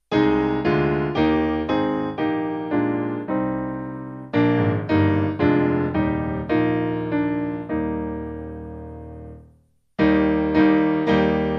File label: Piano